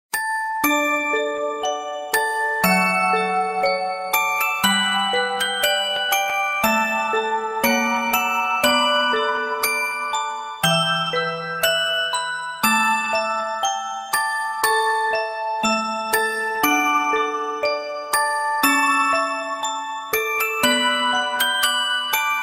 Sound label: Best Music Box